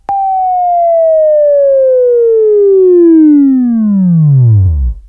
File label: WAV Right, Sweeping